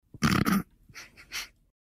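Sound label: smirk, laugh to oneself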